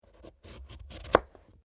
Carrot cut; Food Prep; Restaurants

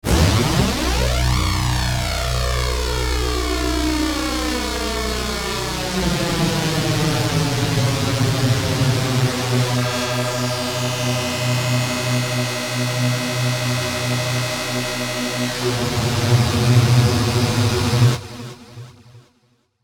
Sweeper Synth Low Oscillation; Production Element Imaging Element Accent Transition; Sweeper Sound Effects

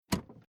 Tool Box Picked Up Metal Rattle

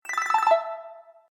Bright Sound Video Game

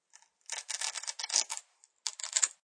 Tearing Velcro sound, Velcro Rip Slow; Ripping

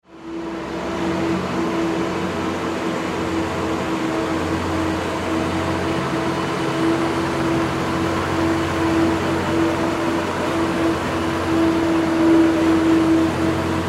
Zamboni Ambience, Zamboni Clean Ice; Miscellaneous Sports

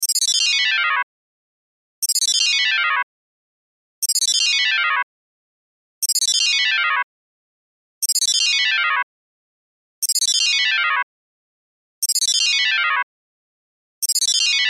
Cell Ringtone; Loop; Cell Phones; Electronics

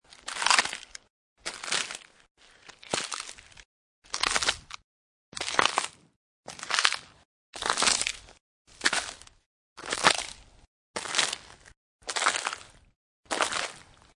Snow And Ice Footsteps Ice; also great as a bone break, breaking bones sound; Weather

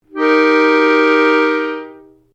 Piano Accordian G Major Long; Old Piano accordian; Pianos; Musical

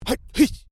Male Vocalization "Hun Cha"; Male vocal sounds; Exclamations; Voice

